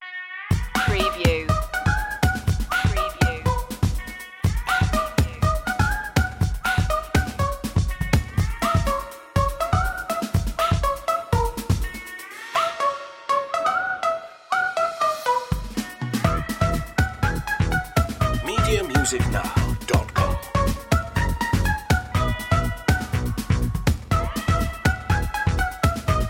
bubbly no synth keys

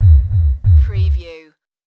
Underground Thumping or Dark Pulse

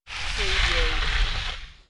Skiing, Snowboarding, Slowing down and stopping

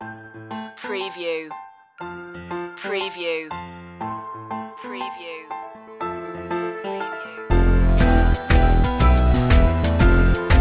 cartoon kit the umbrella

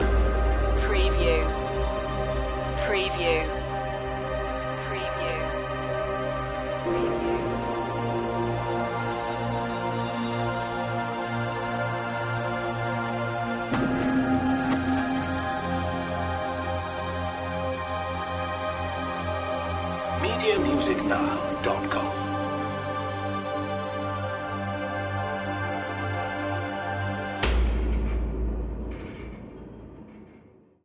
ethnic movements

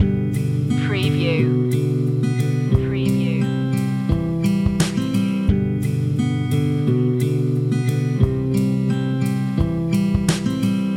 tap your knees loop

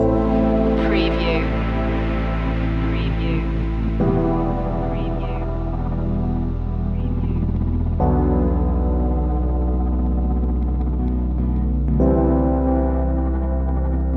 calm shores loop

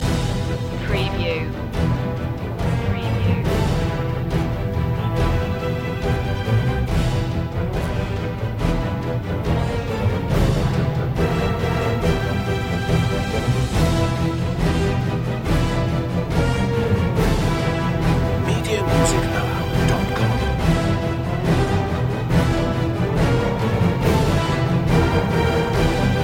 no choir no solo vocal

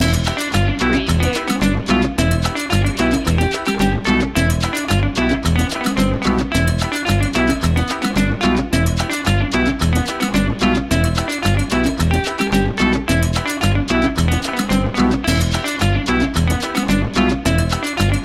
peruvian patrol loop

